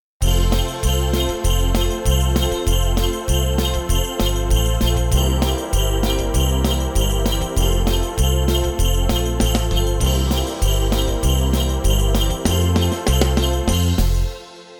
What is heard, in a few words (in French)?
Noel jingle; Jingles medley